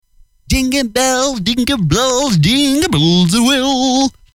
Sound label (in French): Jingle bells ( chant lutin ), ACAPELLA; pack noel